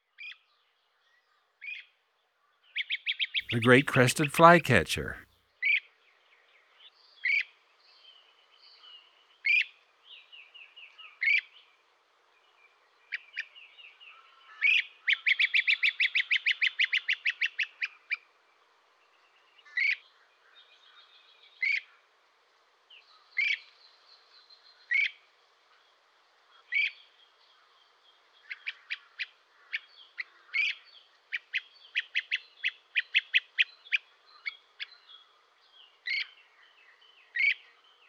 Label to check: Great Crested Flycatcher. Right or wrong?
Right